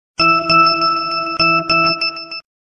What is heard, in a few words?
e6 original 3d sms; ringtones